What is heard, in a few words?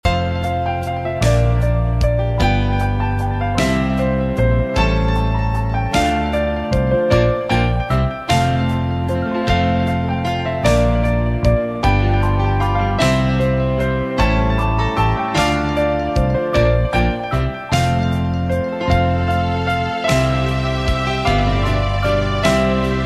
We Wish You a Merry Christmas Instrumental